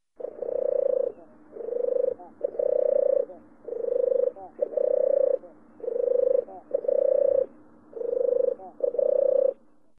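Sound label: European turtle dove voice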